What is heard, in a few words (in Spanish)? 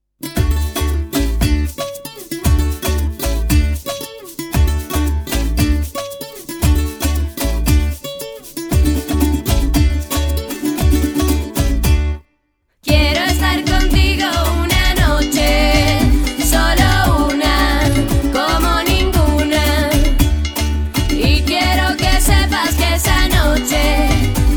antes sol okarina